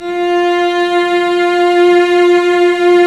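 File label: strings, mf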